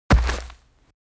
creature coloss step

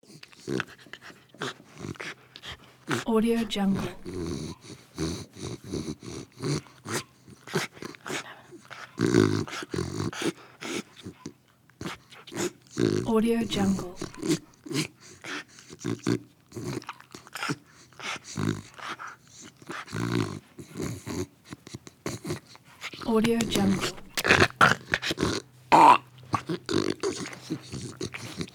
creature wheezing nasal breathing snoring

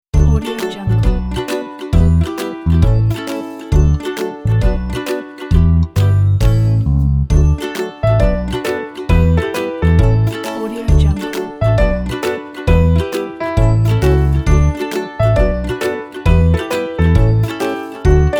positive morning mood

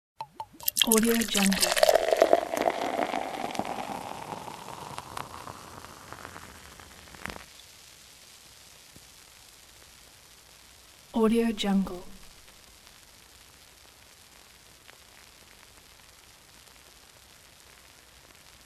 beer is poured into a glass

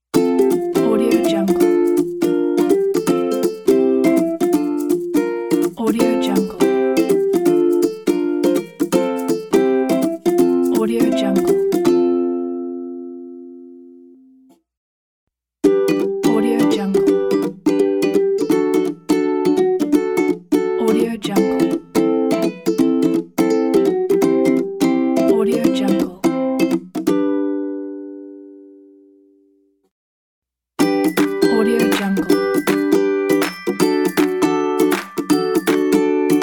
funny kids logo pack

